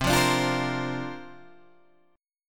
C 7th Suspended 2nd Suspended 4th C down Guitar Open G